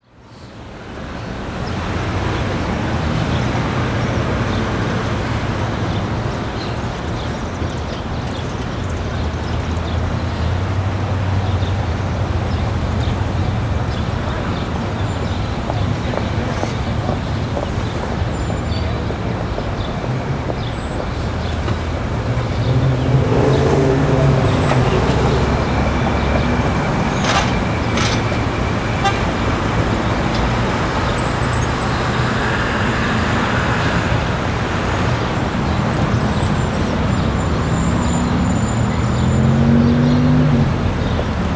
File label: City Streets